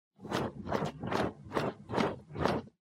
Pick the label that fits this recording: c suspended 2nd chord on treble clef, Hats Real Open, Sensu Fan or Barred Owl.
Sensu Fan